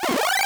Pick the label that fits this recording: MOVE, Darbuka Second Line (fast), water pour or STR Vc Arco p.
MOVE